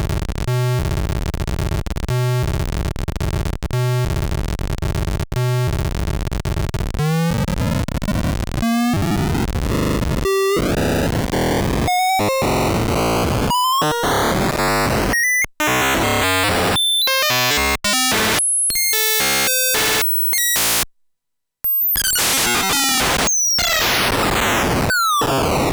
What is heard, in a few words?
CV Wave, pokey